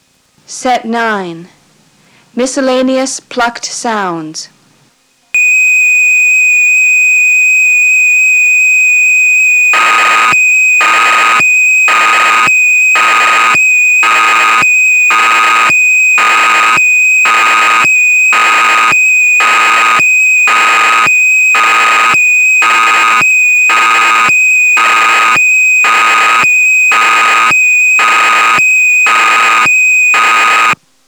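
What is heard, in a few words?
Miscellaneous Plucked Sounds; dx9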